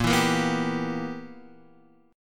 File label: B Major 7th Suspended 4th Sharp 5th, chord